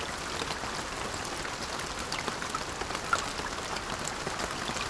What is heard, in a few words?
rain; Pirates Of The Caribbean